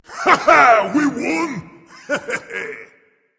yay berserker; cuchulainn

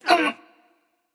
grunt lift passion; chaac

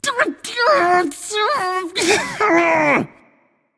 grumble; smite; voicepack; janus

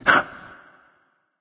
breath; smite

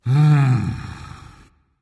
content, voice; smite; voicepack; ymir

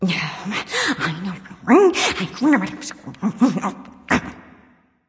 grumbling; smite; aphrodite

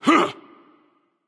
grunt jump; smite; guan yu

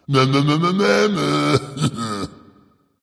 tongue dragon; fafnir

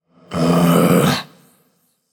ugh; smite; vamana

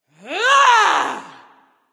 battlecry; smite; terra

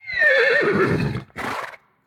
lobby defeat pet vocal; smite; lancelot

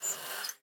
weapon scrape; Smite; Sobek

